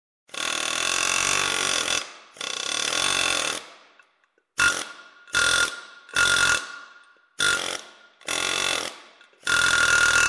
Aluminum Groove Cutter

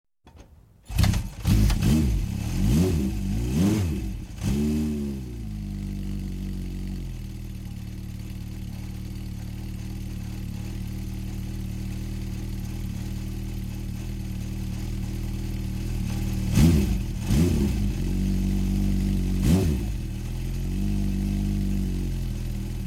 Super moto bike engine start idle

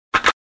Big Plastic Button Press

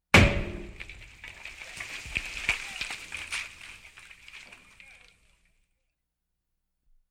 Ballista Shot

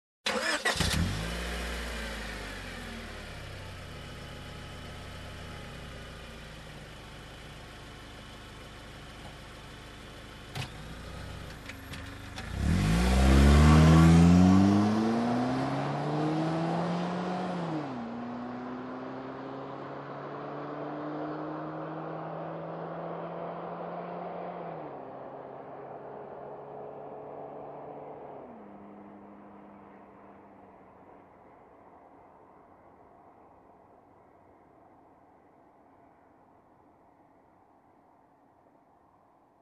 Car Engine ignition and drive away fast

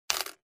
Coin hitting coins

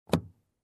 Car door handle inside

